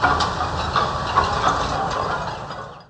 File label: ship; Mafie 1